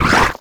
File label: imp chatter combat, monsters; DoomRTX; imp5